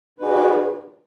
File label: Amtrak